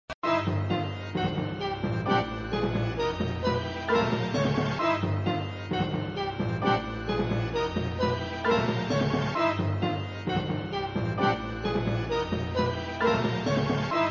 Music Rope bridge music looping; Indy

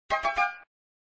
Fanfare; flipper

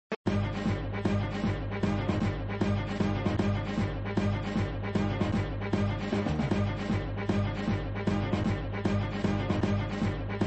Music Get 2 Ball multi ball (looping), flipper

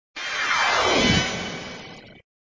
Sound SFX, flipper; sttng

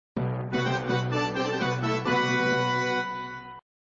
Music Fanfare prior to burp; Indy

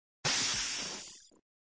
Sound HiHat, snake, flipper; Indy

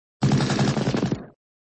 Sound Gun sound; Gun sound v1

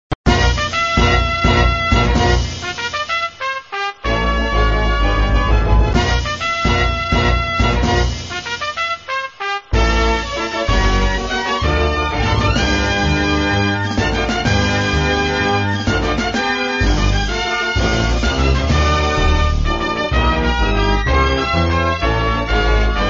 Music, flipper; sttng

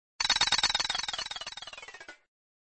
Sound No credits sound effect; No credits sound effect (played after monkey sound); flipper

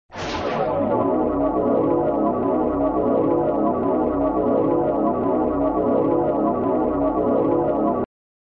Sound SFX, flipper

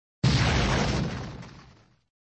Sound Muffled gun sound effect, flipper

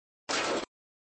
Sound Tone, flipper

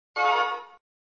Sound SFX, flipper; sttng

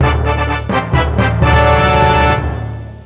Music Fanfare

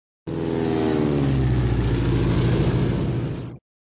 Sound Plane flight; Plane flight (Ramps) v3; flipper

